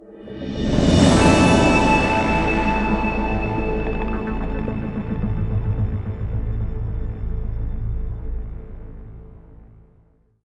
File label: Activated Fanfare; Zaphenge; Oddworld: Munch's Oddysee